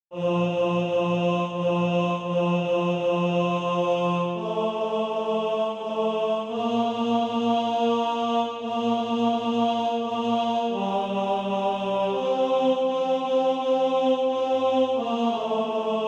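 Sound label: tenor, Practise Music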